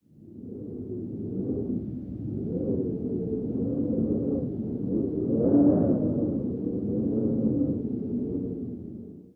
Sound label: Wind; ambience